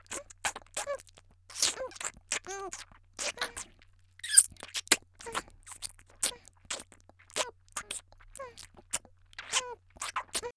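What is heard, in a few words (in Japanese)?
少女「フェラ」控えめ10秒; 元気な少女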